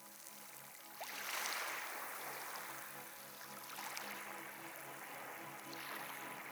Quiet Waves; Seafoam

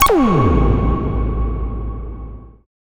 Fail Game; FREE SFX PACK VOL 1

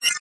terminal, sci-fi; sci-fi sfx

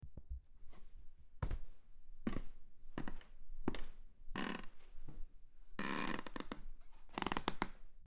Wooden Foosteps; horror sfx vol 2

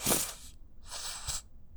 Plop - Wheeze, bottle plops

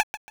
menu; Free SFX for anything

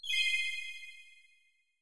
confirm, tones; inteface sfx pack 1

